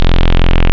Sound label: VV 4072 Square, wavetables; Animoog